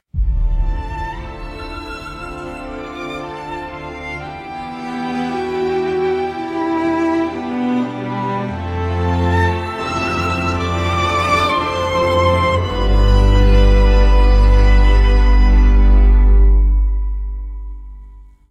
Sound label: cinematic logo ascend